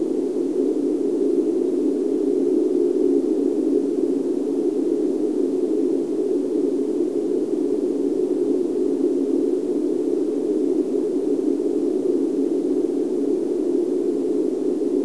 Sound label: wind tunnels; sewer